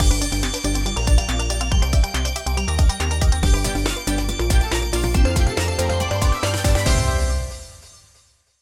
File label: win; power jackpot win